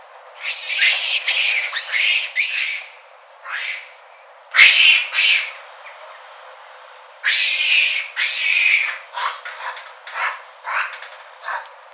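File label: Macaca fuscata aggressive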